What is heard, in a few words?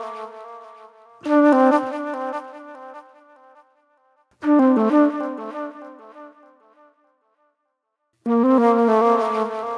Acid Trip Flute; Sonic Foundry (Sony Creative Software); Manhattan Flutes; Smooth Groove